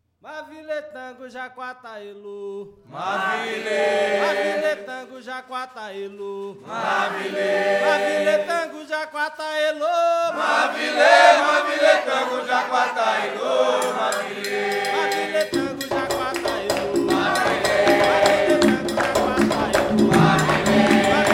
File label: tango; Mavile